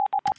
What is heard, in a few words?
zrtp security failed; blink-cocoa